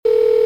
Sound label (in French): Phone raccroché